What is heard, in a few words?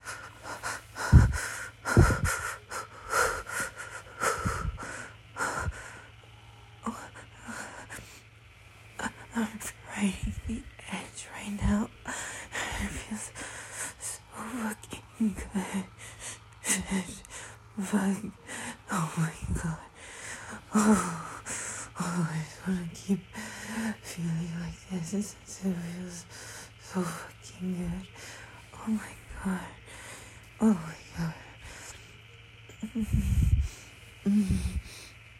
Incoherent edging noises